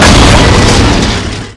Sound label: heavy crash, vehicles